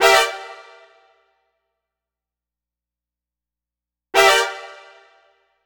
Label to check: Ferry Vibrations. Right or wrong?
Wrong